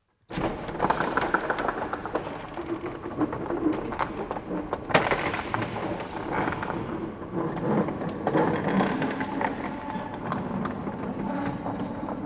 Industrial Mechanical Electric Door Large Close Perspective Open; Metal Door